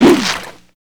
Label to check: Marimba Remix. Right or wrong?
Wrong